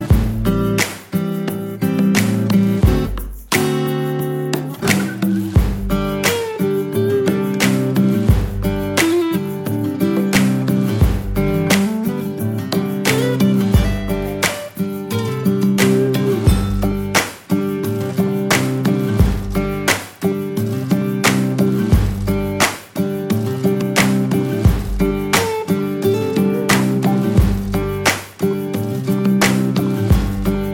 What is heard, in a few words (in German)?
Playback ohne Backings